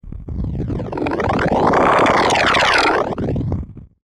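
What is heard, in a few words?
flutter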